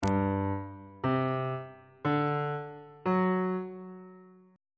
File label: g major 7th suspended 4th chord intervals